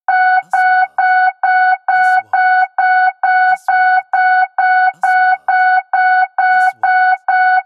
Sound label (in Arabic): نغمة تنبيه خطر; transitions